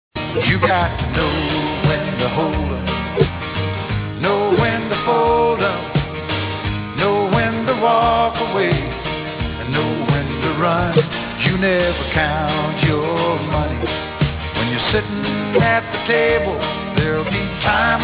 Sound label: 8kHz, Aliased (no low-pass filtering), music; aliasing (due to undersampling); Sampling rate